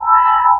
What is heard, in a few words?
med tone; Dystopia; implants